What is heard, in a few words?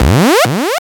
warp